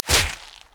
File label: Hit